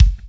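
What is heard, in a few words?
bass drum 70s, drum kit; E-Lab; X Static Goldmine 2; akai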